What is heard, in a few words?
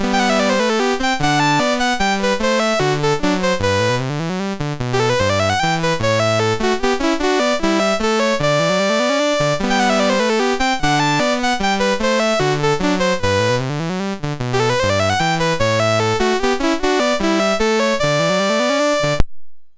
Prelude in G, MOS6581R3